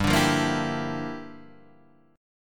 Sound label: G Minor 13th G down, chord; Gm13 strummed; JGuitar